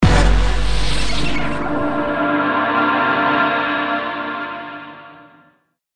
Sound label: pong